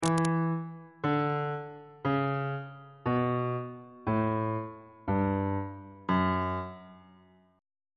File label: g flat phrygian mode descending on bass clef